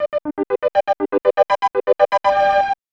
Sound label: Get Key Item; Idea no Hi; SNES